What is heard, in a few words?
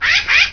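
bird squawk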